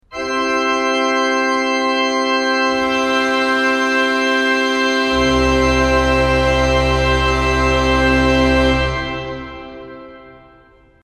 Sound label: pedal; izola